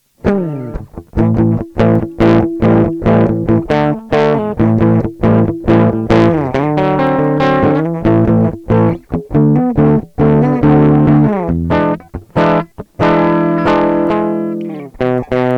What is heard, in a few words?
Fuzz Test